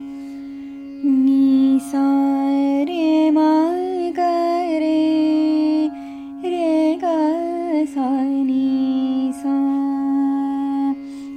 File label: Raga Des Gat